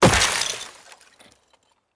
Ice Block Break

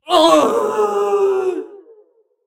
Swordcaster Old Death Vocals

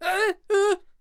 Ice Archer Death Vocals

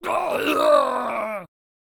Headbutter Death Vocals

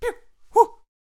Poacher Attack Vocals

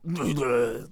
Necromancer Death Vocals

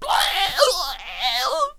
Sensei Death Vocals

